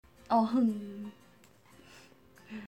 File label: growl